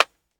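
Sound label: weapon generic disconnector, triggers; foley; gmod; viper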